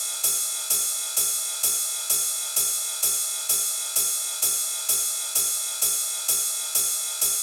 Drum Loop Edge 129BPM

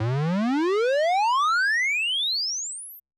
fx oneshot siren sweeps risers medium dry; Deep Space Textures V2

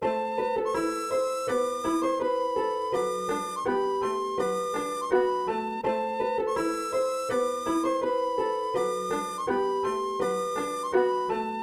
Melody Loop Amin 165bpm

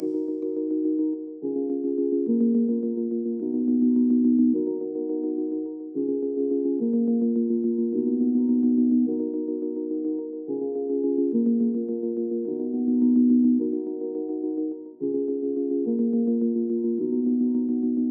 106bpm Keys Ebm

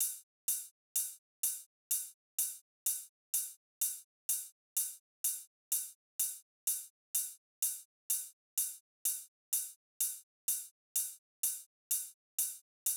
Drums Top, 126bpm

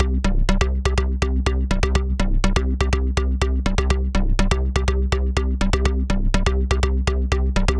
BASS, G

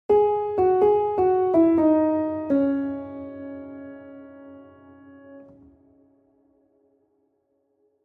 PIANO LOOP CHORDS 125 BPM Key A; BEAUTIFUL

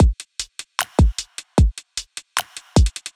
Trap Drumloop

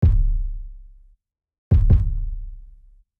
Kick

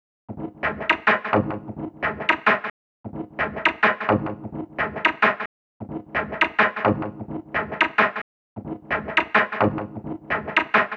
174 G- Synth

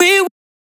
Vocal One Shot Male C

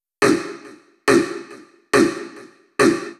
140 CHANT LOOP

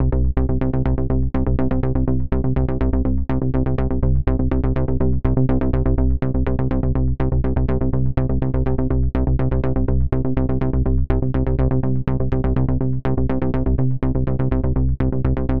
BASS C

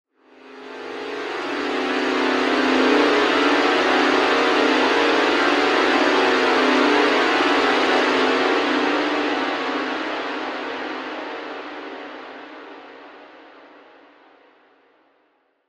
Dissonant Horror Chord

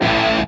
guitar stab powerchord A

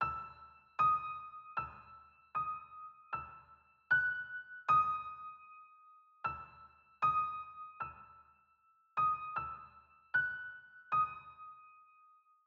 C 154bpm keys; Yung Boi Drip Vol 6

